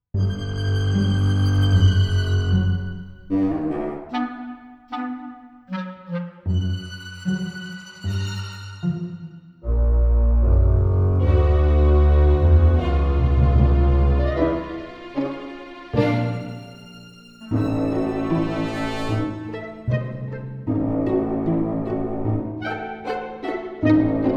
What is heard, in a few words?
Ventwall Music; Hector Episode 2 Senseless Acts of Justice